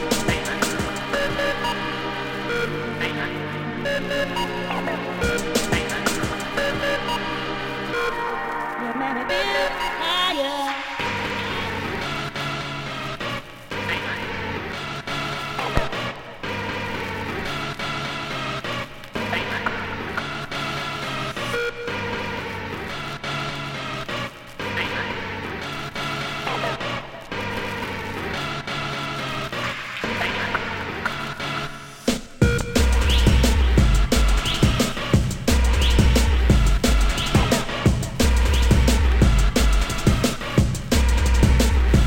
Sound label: dnb